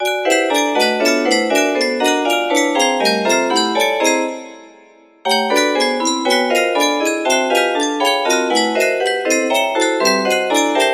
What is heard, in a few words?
melody